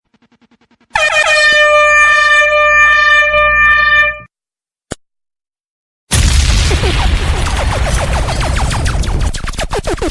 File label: horn laser explosion